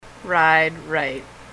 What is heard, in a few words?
ride right